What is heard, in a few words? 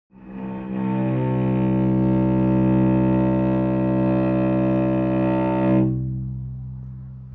cello c2; tuner